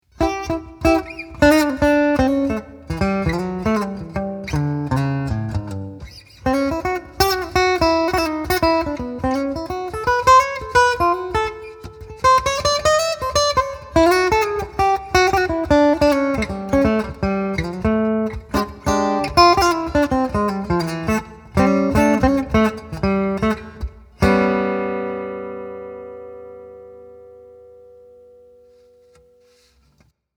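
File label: Angel 3 Blues Jazz Line, Westerngitarre; Cole Clark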